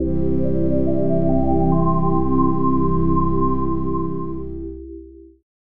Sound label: win